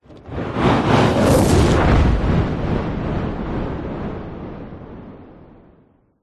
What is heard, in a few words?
science fiction ufo spacecraft fly by on fire explode; zapsplat